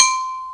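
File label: Bottle hit, Drum Samples, Roland JD-990; Bottlhit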